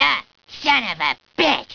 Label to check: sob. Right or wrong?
Right